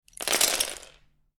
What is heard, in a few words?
Lego Several Pieces Drop On Floor; Miscellaneous Toy Sound Effects